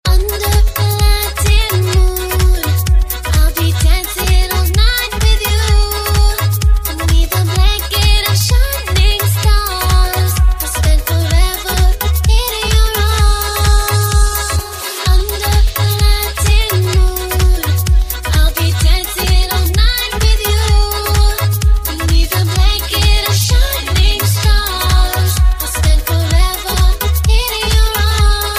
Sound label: latin moon